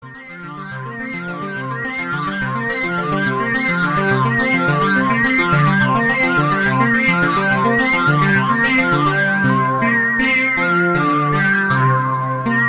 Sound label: Electronic sound, ringtone